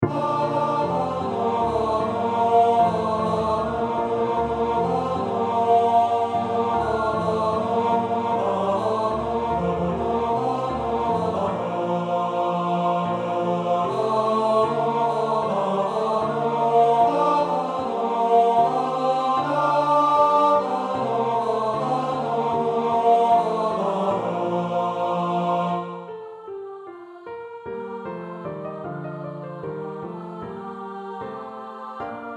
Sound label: The cherry tree carol Tenor